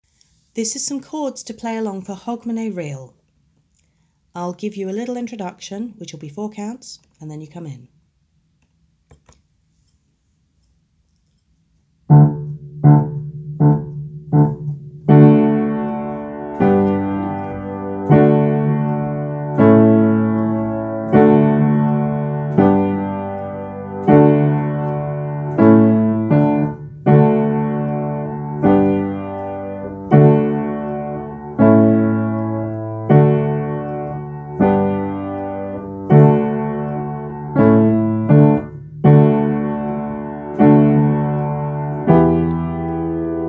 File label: reel piano only; 31 hogmanay